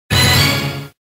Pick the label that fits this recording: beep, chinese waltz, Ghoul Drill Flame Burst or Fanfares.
Fanfares